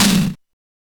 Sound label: Power Tom; Genesis